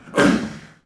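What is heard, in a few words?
panther attack vox; Civ4 warlords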